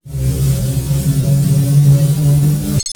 knife charge long; player

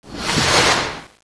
fireworks throw

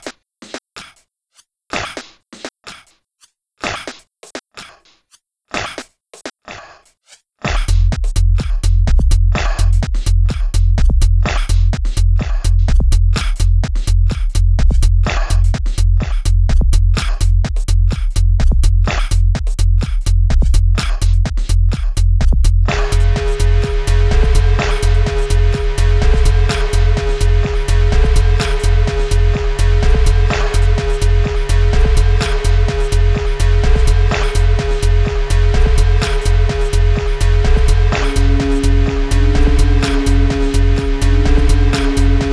music

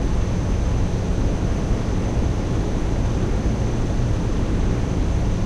generator hum; ambient; shutdown

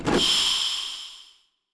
arrows

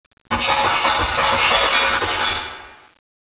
scratchy

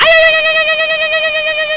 bang; islandesc

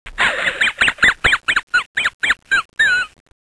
hihihi

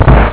dungeon door open; zelda

